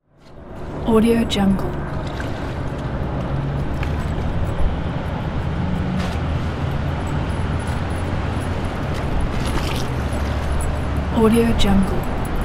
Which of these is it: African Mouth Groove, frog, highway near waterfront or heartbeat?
highway near waterfront